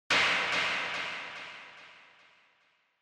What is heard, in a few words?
Impact Delay; Onoma